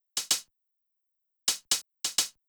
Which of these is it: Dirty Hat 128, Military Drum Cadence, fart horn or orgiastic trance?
Dirty Hat 128